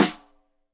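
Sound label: Snare Stereo, Drums; Deeply Sampled Drums; SMDrum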